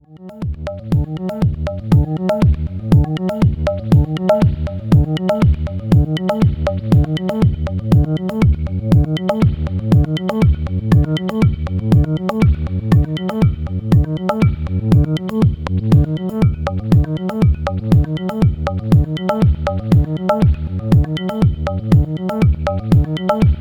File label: Rossum Electro Music Morpheus; Kontext B